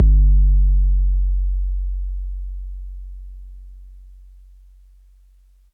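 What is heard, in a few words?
bass drum 808 MPC60 Noise F#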